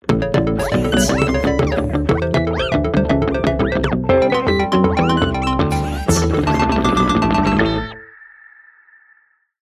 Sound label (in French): effets guitares